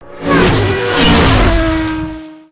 Cars pass by

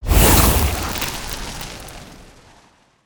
Ice Shuriken Throw; Spinjitsu

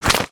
Stink Bomb Fish Flop

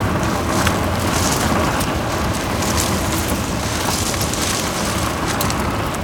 grass tread, vehicles; aronwk collection; ndaudio

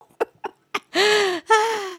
laugh

